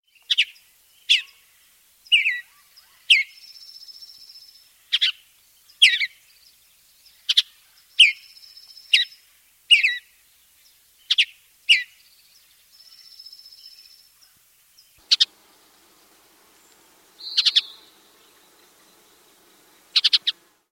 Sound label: mountain bluebird